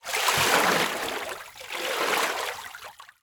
Liquid Water Water Splash Wave Two Waves

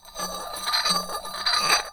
Glass Roll Beer Bottle And Test Tube; Crashes

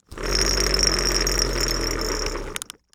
Foley Toy Rattle And Vibrate

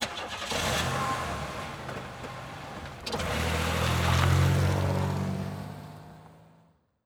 Auto Buick Start Up Drive Off Fast; Transportation

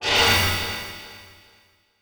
Transition Metallic Blast; Production Element

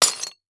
Impact Ceramic Debris Hit By Plastic Tube; Impacts

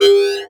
Multimedia Internet CD-Rom Flash Hi-Tech

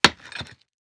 Pick Axe Hit Concrete, weapons; AdobeCC

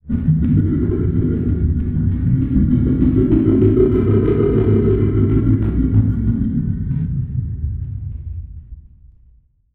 Production Element Title Transition Liquid

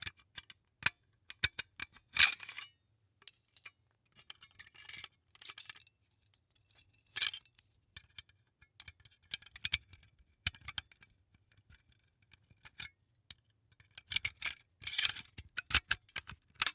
Industry Tool Gun Staple Gun Loading

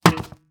Impact Tire Fall On Cement; Impacts

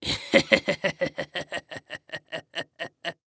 Horror Scary Human Male Laugh Evil Villain Laugh

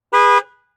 Chevy Trailblazer Honk Horn Short; transportation

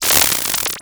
Animation Electronic; Multimedia Internet CD-Rom Flash

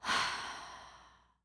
Human Female Sigh

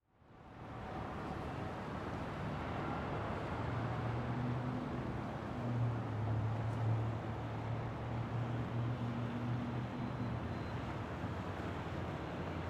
Ambience Traffic From Above; AdobeCC

